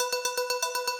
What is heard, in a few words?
Win Count Up Loop; eldorado